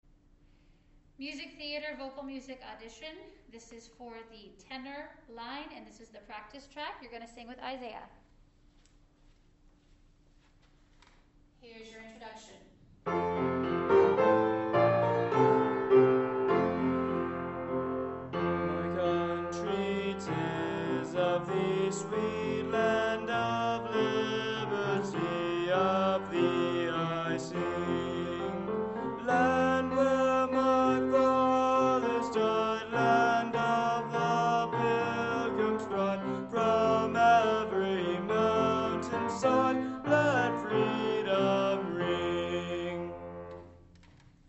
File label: tenor prac